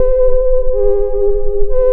Synth Melody; Eclectic Electronica

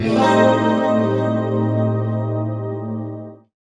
guit strum (D#); Sonic Foundry (Sony Creative Software); Ugly Remnants VOL-2

